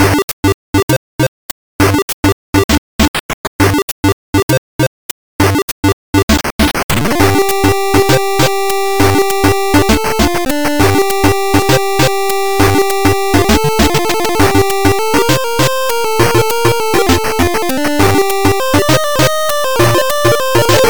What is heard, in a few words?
Battlefield 8bit; Game Music